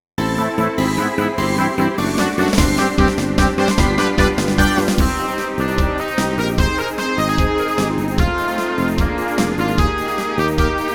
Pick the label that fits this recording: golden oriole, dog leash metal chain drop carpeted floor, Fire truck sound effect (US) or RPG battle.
RPG battle